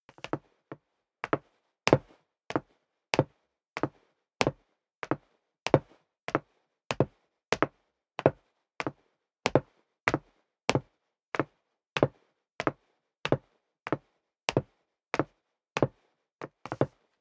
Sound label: step dress boots wood parquet downstairs sequence; schritte; Footsteps; menschen